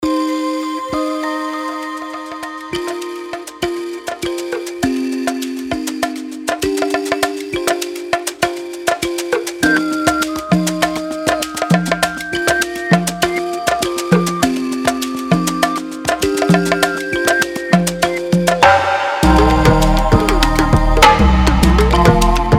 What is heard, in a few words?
Percussion Afro Percs; RARE